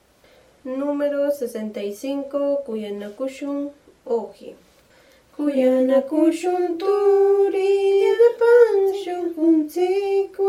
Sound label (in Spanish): cancion